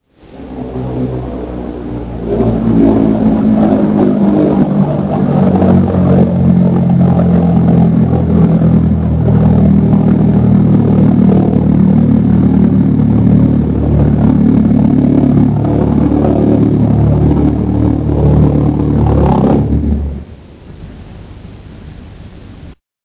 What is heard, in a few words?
v1 rocket